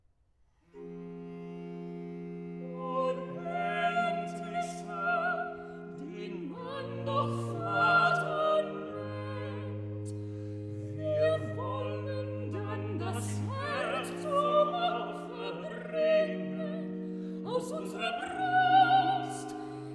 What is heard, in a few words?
Cantata, Recitativo (Sopran, Tenor); Bach; BWV 173 'Erhöhtes Fleisch und Blut'; Long Melford; Whit Mon